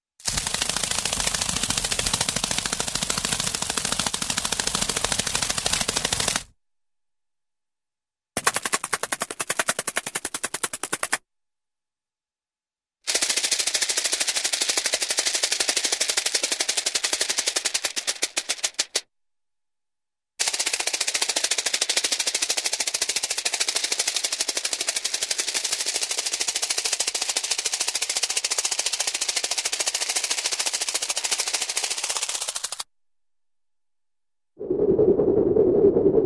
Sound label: Steady Rapid Insect-like Wings Flap; Hollywood Edge Cartoon Trax